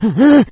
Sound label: become alert, male, infected